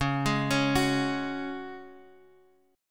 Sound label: Db Suspended 4th Db, Guitar, Half Step Down